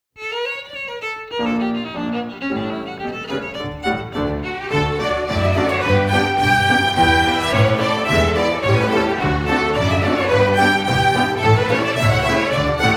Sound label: Polka